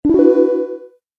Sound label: paddle reduce; BrickOut